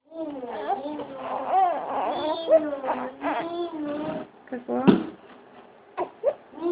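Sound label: baby hungry, female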